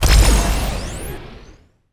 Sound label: ui collect coin high